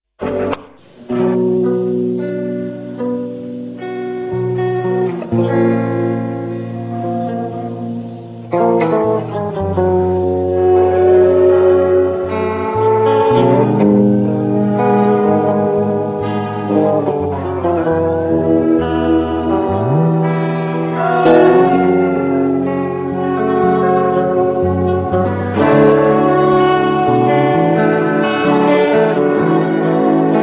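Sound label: guitar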